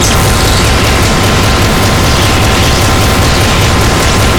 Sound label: flak gun shoot crit, weapons; tf2classic